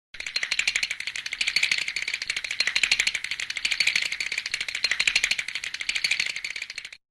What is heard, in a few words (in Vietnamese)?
âm thanh run rẩy hoạt hình